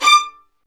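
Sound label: STR violins, Roland; L-CD702; Vlns Marc4-5; Vls Marc4 x8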